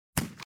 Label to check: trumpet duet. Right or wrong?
Wrong